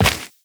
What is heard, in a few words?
Run Dirt, Footsteps; Warframe; Tenno